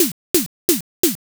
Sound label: footstep gravel